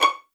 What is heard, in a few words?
PIZZ C#6, Violin; Q Up Arts; Denny Jaeger Master Violin Library; PERFORMANCE